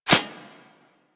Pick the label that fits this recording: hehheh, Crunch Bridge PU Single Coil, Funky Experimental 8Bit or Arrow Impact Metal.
Arrow Impact Metal